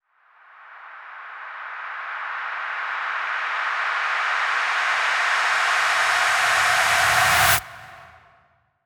FX Uplifter

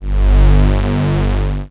Deep Dubstep, BASS; Loopmasters; Jack Sparrow; Deep Dubstep Progressions

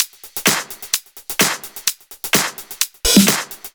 Loop, 128 BPM

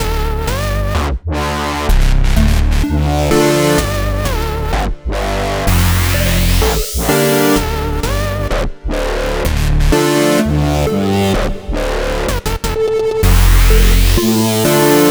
Full Mix, Dm, Complex Electro Synths; Deus; Prime Loops

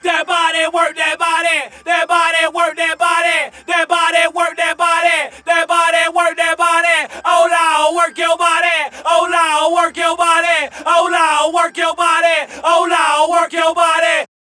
VOX

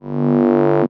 GROWLS G1, BASS; Loopmasters; Jack Sparrow; Deep Dubstep Progressions

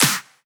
Claps & Snares

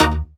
Synth Shot D#; Mutekki Media; Vengeance Electroshock Vol.2

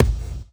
Kick

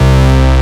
Bass Shot C; Mutekki Media; Vengeance Electroshock Vol.2; Bass Shots

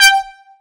Synth Shot G; Mutekki Media; Vengeance Electroshock Vol.2

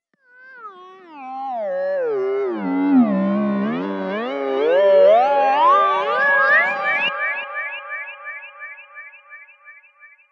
swoosh

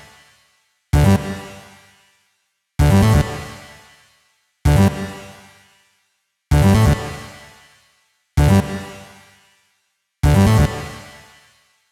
129 G Synth, Bpm129, Complex Electro Synths; Prime Loops; Androids

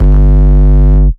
Bass Shot G; Mutekki Media; Vengeance Electroshock Vol.2; Bass Shots

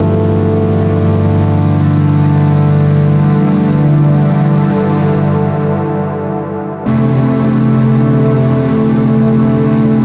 Bpm140 Tundra Pad Loop GmDm, Dubstep

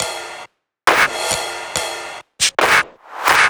Bpm137 Top Loop; Dubstep

